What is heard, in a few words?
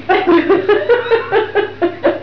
lach